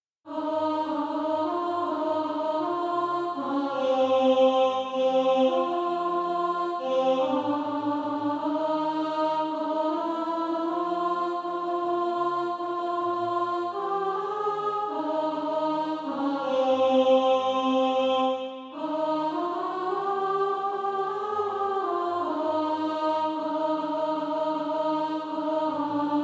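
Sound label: alto, Practise Music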